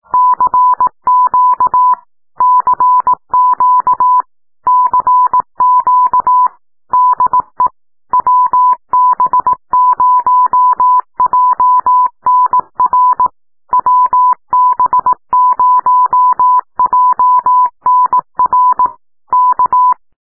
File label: cw cq